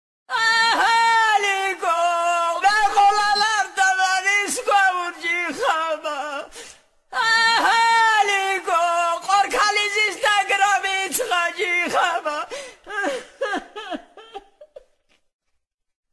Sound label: Svan woman lament